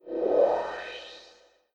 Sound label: reveal Location